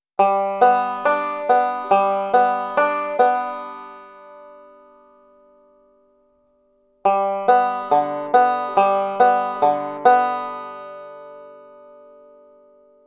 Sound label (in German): alternate picking wechselschlag beispiele